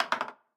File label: diamond, roulette; advanced-roulette